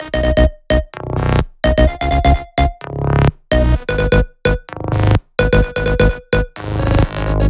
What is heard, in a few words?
128BPM Melody; Vengeance Samples; Suisse Kit